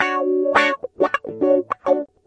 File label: funky rhythm guitar; GUITARS